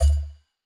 Command Click; starbites

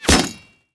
Fan

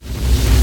Power Shoot Charge; Miner

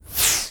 W H O O S H, Air, Very Slow; Legend of Keepers; Mono

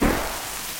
Bomb Ready; In Merged Bomb Bomb Ready

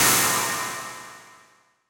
Science Fiction Magical Burst Metallic Sparkle

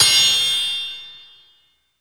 Fight metal Impact

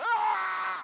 Death Voice

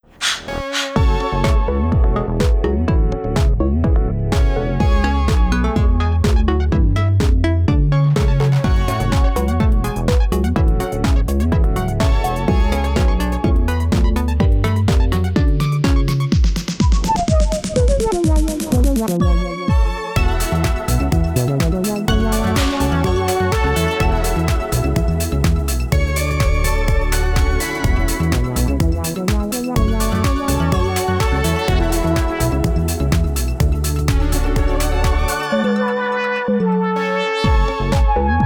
Credit Song (Instrumental); the jackbox naughty pack

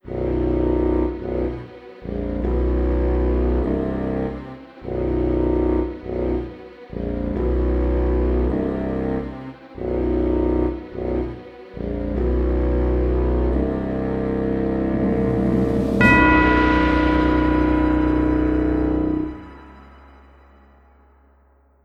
Windy; Conker: Live & Reloaded; Xbox

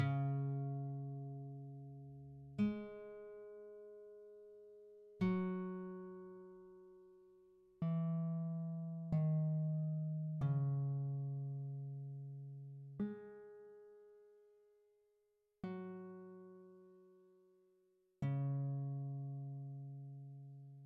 Spanish Steel Guitar

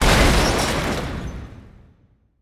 Suit Crash

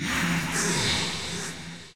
Magician Attack

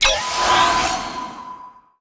ui academy research fill

